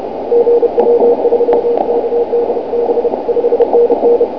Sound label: cw